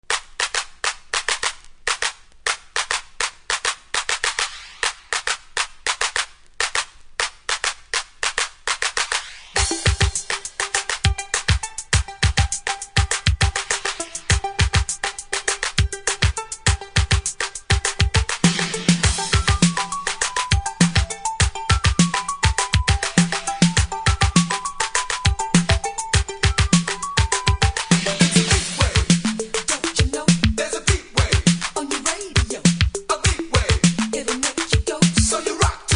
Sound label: beatwave